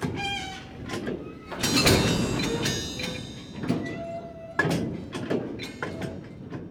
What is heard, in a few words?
shunting track, train; best service Blue Box